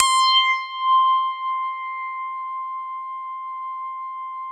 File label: JV-1080